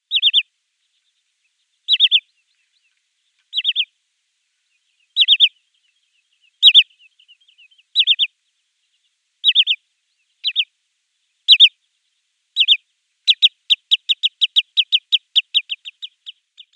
Wood Sandpiper flight calls

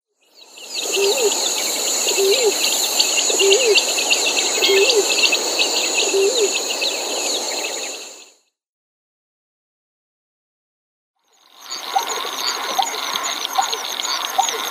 Painted snipe display

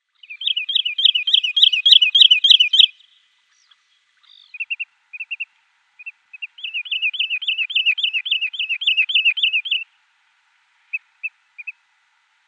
Wood Sandpiper display flight

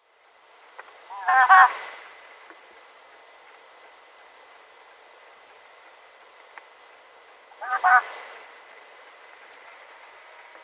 Marsh Owl male display

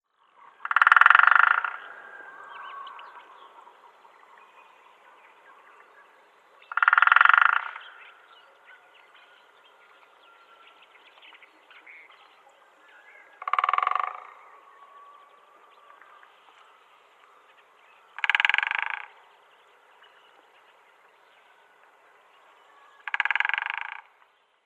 Syrian Woodpecker drumming

